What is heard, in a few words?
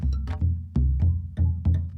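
Calm Far, music; from dust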